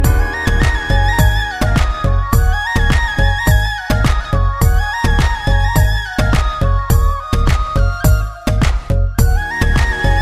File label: iphones flute ringtone